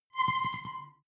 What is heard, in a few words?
sci-fi alien console sparkle; maschinen; signale; soundpool